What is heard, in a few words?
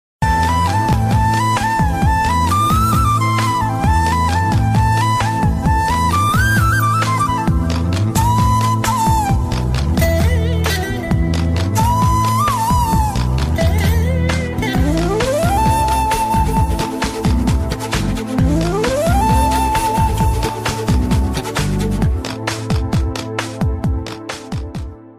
nice flute; ringtones